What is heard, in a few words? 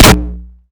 gp25; Modern Warfare